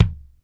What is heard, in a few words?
BASS, DRUM MACHINES, Alesis HR16